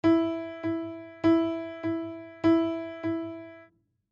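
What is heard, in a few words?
Two quarters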